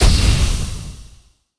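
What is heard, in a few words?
magic explosion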